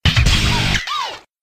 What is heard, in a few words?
goal; tony hawk